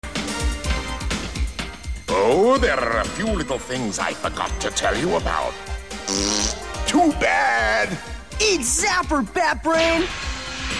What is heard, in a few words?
razz